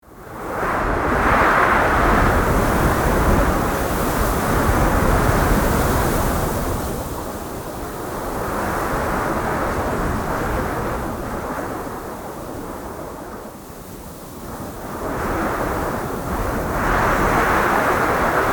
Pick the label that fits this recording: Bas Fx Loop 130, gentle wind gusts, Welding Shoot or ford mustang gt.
gentle wind gusts